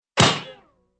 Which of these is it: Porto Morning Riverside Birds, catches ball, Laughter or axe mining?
catches ball